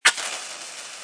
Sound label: bomb get; merge-dragons-2